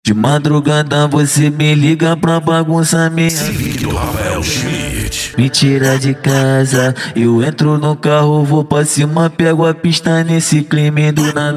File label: funk mega bass